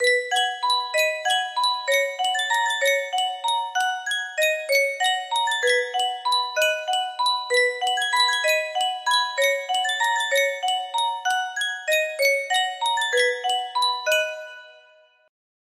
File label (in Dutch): yunsheng muziekdoosje, music box melody; venlo